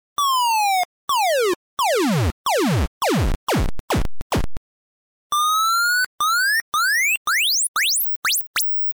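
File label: frequency ramp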